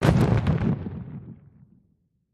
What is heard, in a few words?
4 Oz Concussion Hit S